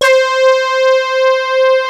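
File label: FLUTISH BRASS; Greytsounds; Scott Peers Sound Engineering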